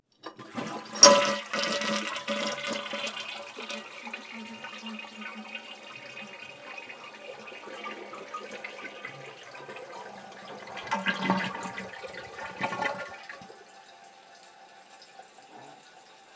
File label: Toilet Flush, Household